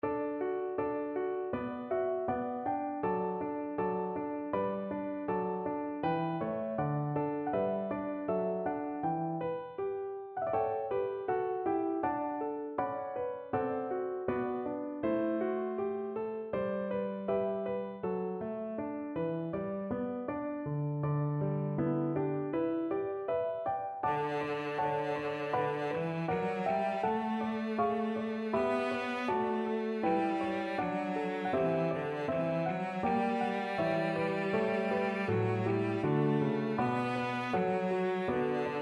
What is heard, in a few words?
reynardine, cello